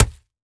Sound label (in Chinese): 泥坯破坏声